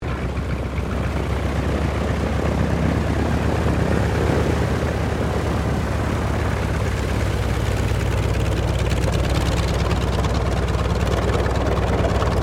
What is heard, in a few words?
AIRCRAFT T-28 TROJAN IDLE SHUTDOWN; Trojan fighter bomber; Airplanes; Vehicles